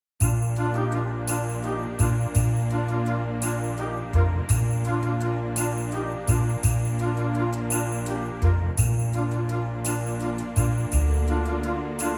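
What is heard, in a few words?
Bb orchestra, backing track; key - Bb - vocal range - Bb to F (huge range); Gorgeous orchestral arrangement (different arrangement from the female versions)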